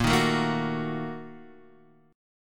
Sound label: Bb Major 7th Suspended 4th Sharp 5th, Guitar, Half Step Down; strummed; JGuitar